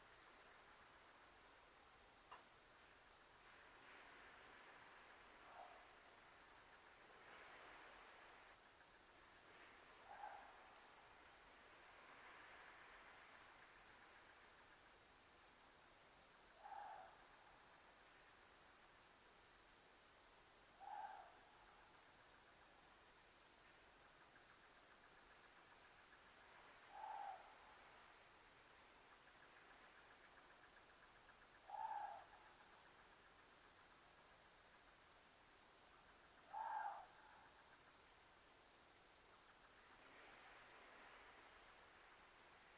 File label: Water deer